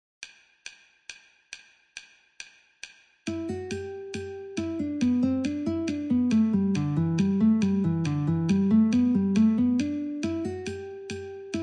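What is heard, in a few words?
G Guitar metronome 69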